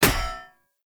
Sphere Polearm Medium Impact Default; Player; Environment

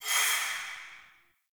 Health Regain; Characters; Heal Stingers; Envoy

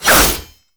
Fist Claw Alt Whoosh; Weapons; Warframe; Tenno; Incarnon

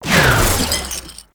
Sickle Heavy Combo; Warframe; Scaldra Butcher Sickle

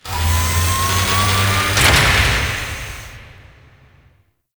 Ship Extraction Ship Open; Vehicles; Warframe; Liset; Liset Flight; Grineer Ship Liset

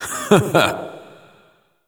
Chuckle; Emotes; Nineteen Ninety Nine; Velimir

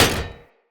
Short Sword Medium Impact Dmg Metal

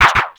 Shield Deflect; Hayden

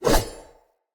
Saw Dagger Whoosh; Weapons; Player; Swords

